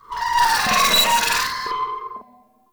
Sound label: Energy Scream; Ambience; Warframe; Corpus Railjack